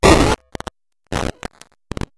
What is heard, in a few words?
game glitch